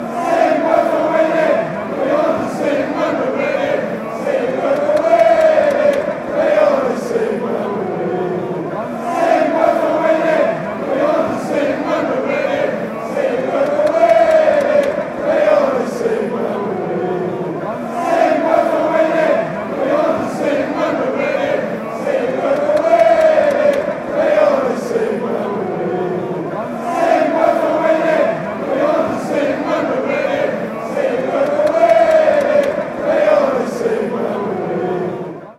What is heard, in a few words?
fanchants